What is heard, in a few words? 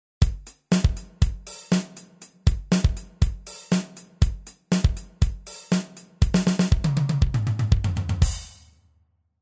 bass drum 10ms attack 400ms release compression full